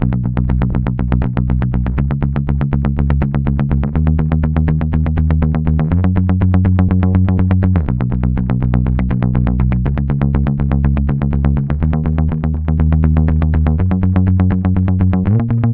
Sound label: Bass loop Machine 122 F#, Electric Bass Loops Direct; Slate Digital BASSHOLE Sample Pack